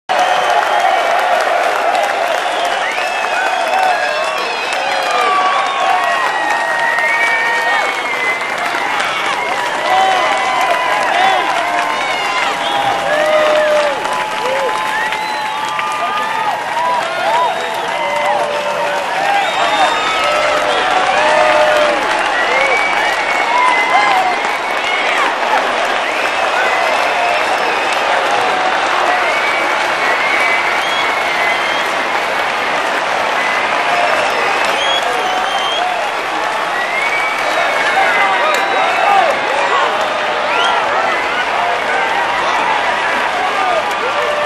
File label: Crowd Large; Colin McRae Rally 05; XBOX